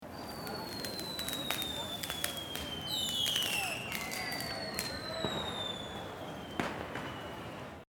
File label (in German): Silvester Pfeifer